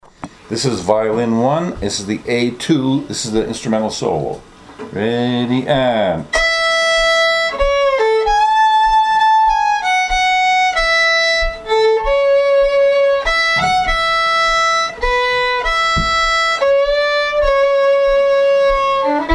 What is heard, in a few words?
violin